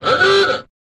Car Horn Classic Ahoo

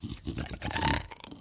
Pit Bull Growling Gar

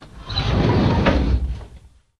Drawer Metal Open Qu

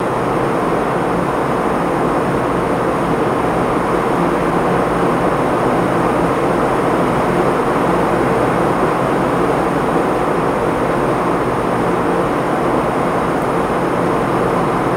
Jet Int Steady, interior

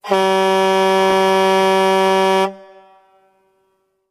Truck Air Horn Low Pit

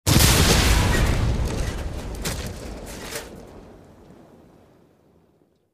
Explosion Metal Deb, metal & glass

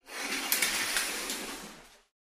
shower curtain; curtains

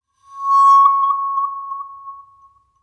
Tone Bar Bowed, chimes

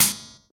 Bullet Shell On Shee, shell casings fall

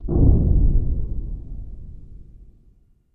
Explo Low Rumble

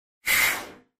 Laser Gun Blast Dark; laser fx

